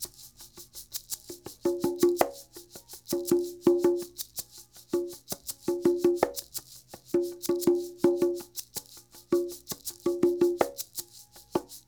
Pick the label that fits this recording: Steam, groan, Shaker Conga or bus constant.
Shaker Conga